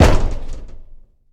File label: Boxing Ring Bodyfall